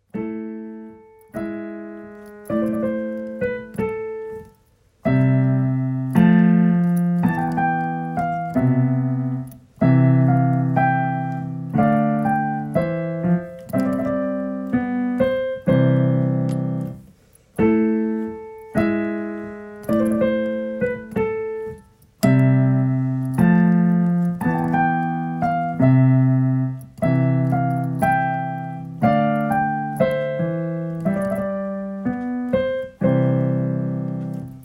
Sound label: Piano